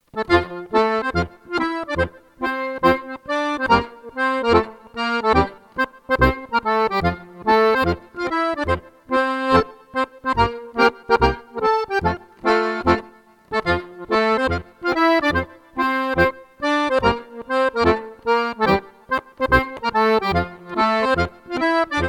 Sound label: Schottisch